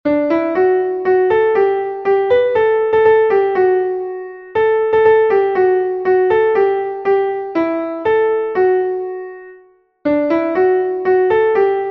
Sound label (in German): klavier; MelodieMeister